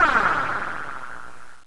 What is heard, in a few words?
decrease; Pokemon Essentials; Pokemon Circon BETA 2 v1.8